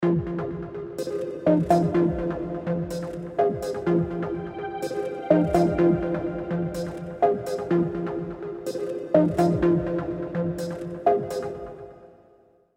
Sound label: ultralite synths 160